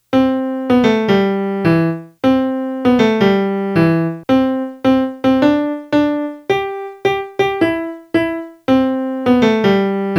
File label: melody